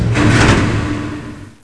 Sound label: elevator